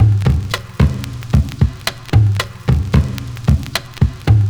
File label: CHEAP STICKS, LOOP; Univers Sons